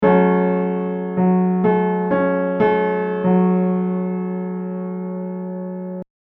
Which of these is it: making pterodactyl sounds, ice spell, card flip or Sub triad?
Sub triad